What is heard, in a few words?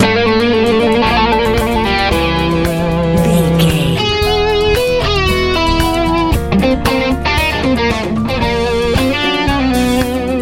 colorful easy rock